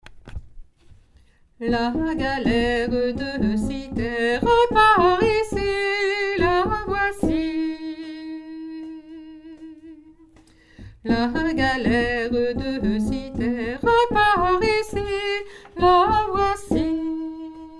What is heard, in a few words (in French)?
couplet alto, chorale